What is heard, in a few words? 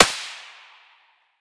svu muzzle mono far, weapons